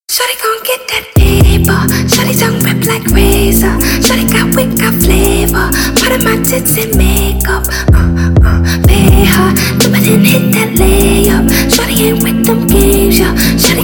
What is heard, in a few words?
bass boosted remix